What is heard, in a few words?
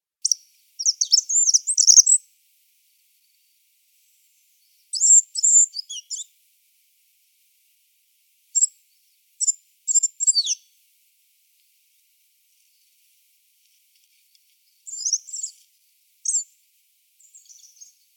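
seraya muholovka golos, spotted flycatcher voice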